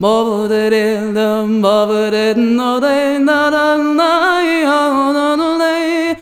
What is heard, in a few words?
VOICES; USB Soundscan vol.63; AKAI